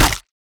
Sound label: melee attack impact; weapons; dota; creep